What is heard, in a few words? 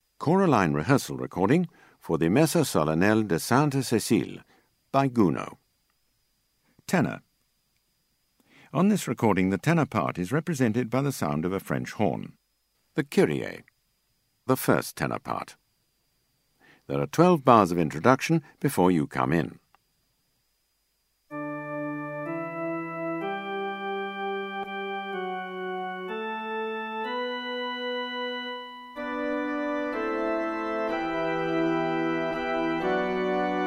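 Ten, tenor; Gounod MS; ChoraLine - Rehearsal CD & EasyPlay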